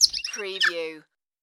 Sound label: Laugh